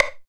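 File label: hi stick, right; East West - Phat & Phunky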